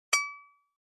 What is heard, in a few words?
Flash Beep Guitar